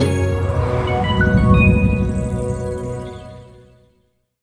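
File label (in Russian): радостное приветственное оповещение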